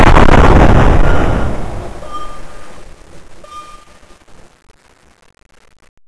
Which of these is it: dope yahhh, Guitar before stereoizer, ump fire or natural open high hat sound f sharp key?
ump fire